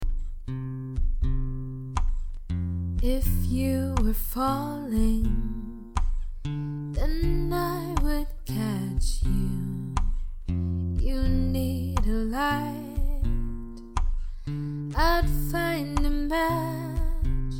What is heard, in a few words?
short cover, singing